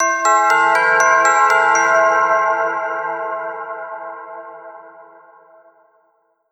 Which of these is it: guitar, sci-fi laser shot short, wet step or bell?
bell